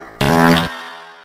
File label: slow mo fart